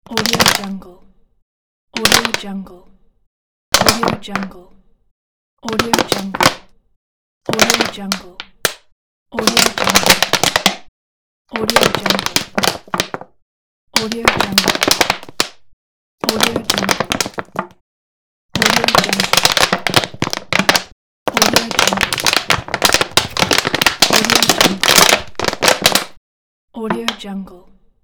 crushing plastic bottles

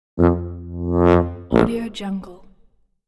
tuba fail

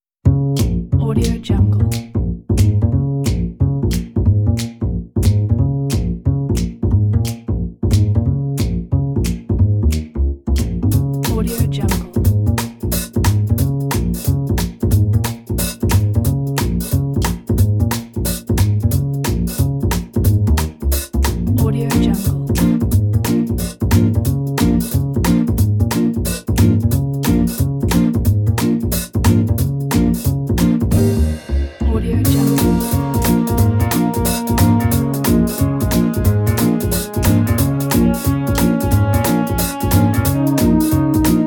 jazz band

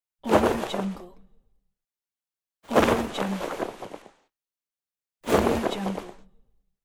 cape whoosh flapping

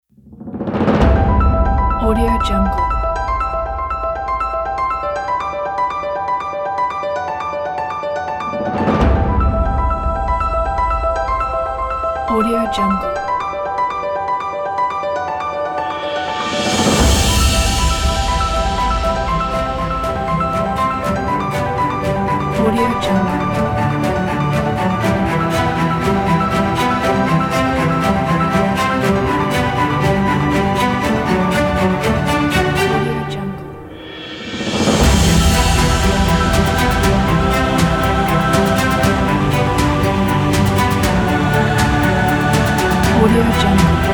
the epical piano

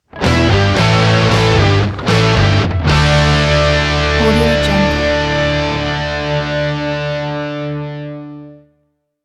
heavy rock logo; af-sounds

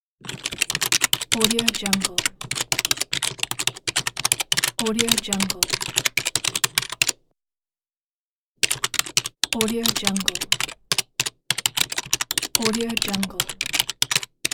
typing

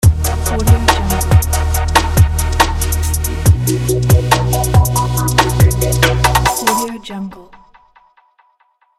vlog hiphop logo

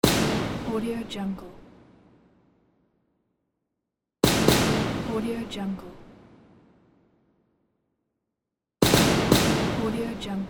shot

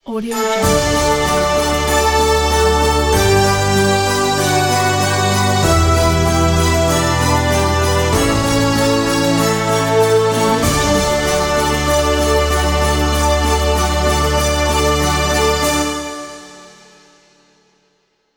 christmas time logo

